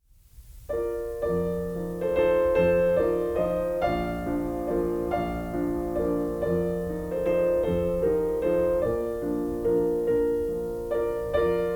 German Dances and Ecossaises in B Flat Major

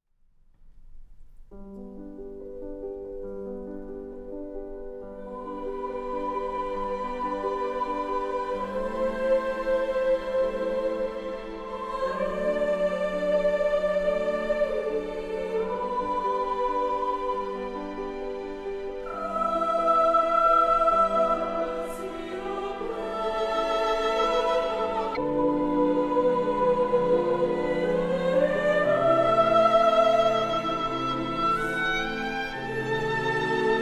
Philharmonic Orchestra Philharmonic Choir Chorus

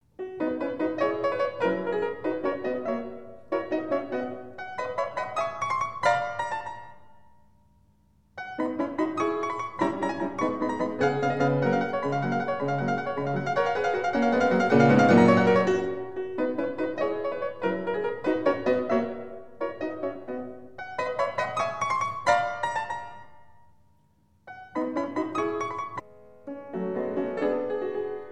Piano Sonata in G major Allegro

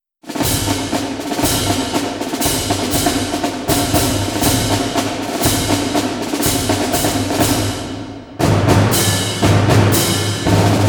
Orchestra

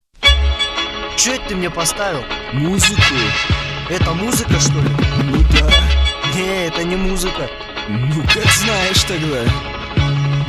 Instrumental

